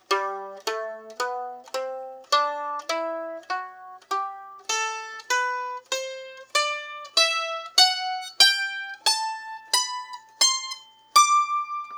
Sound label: scale notes; yin